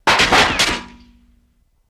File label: Bullet Impact Clang, metal